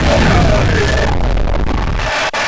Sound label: death; Alien Arena; nemesis